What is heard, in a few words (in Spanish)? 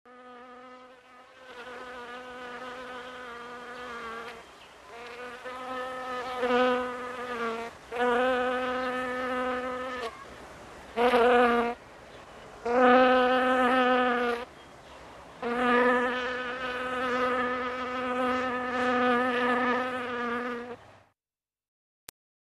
mosca volando